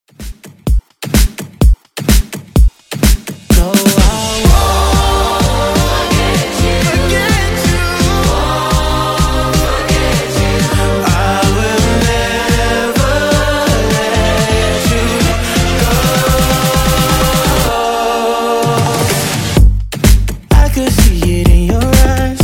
Pop Redrum

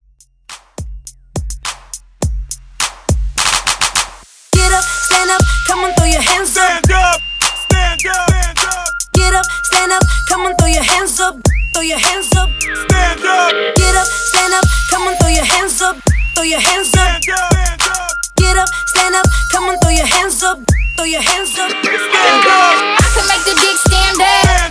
104 BPM

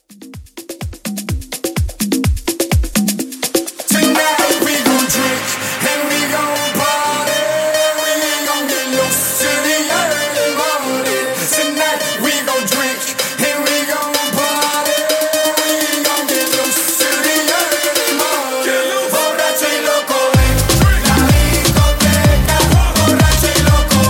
Club Afro 126Bpm Dirty

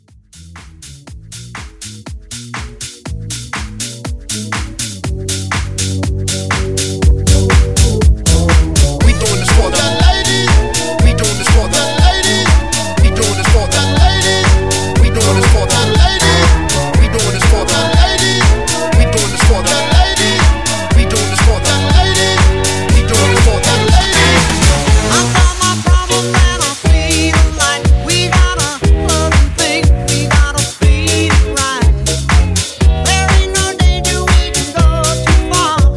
Club Hype Edit 121

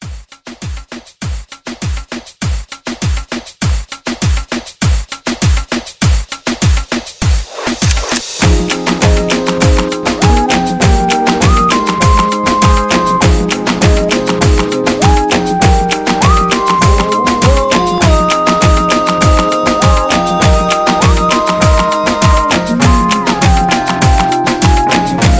Re Drum 100

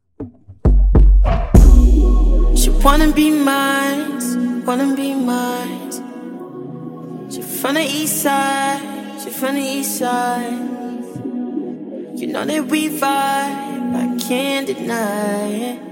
100 Bpm

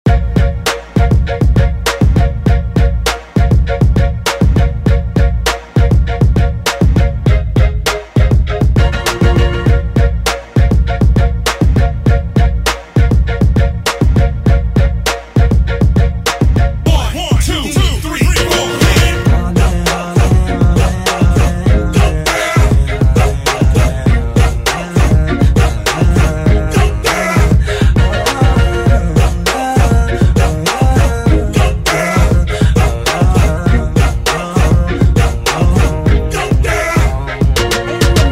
Party Hype; Redrum

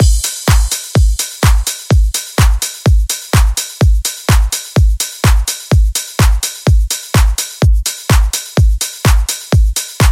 Pop Rework; Remixfreakz

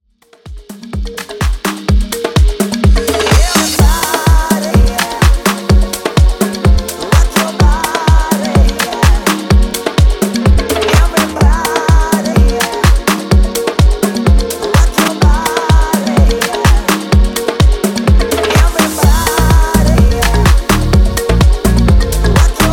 Tribal House Extended 126 Bpm; djyan

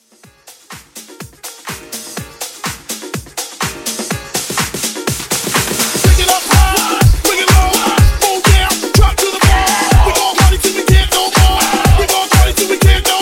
House

